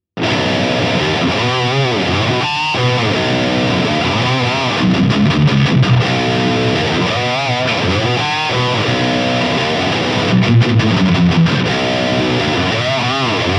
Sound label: Schecter AM 7 High Gain